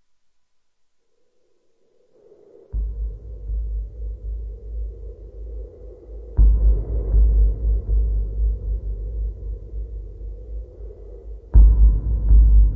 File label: Distant Drums; Junk Jack